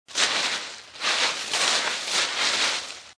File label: Rustling Leaves